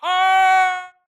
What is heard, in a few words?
grunt death crush; Titanfall 2